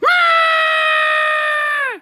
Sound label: waaaagh